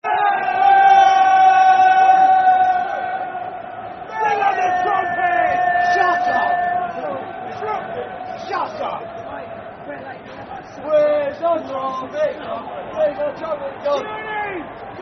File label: Bring On The Trumpets Football Chant (Soccer Song); Millwall Mfc